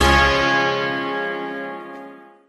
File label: bright strum, Guitar; Notes